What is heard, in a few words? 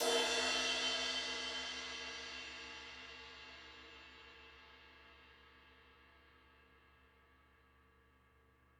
Ride A Ping Crash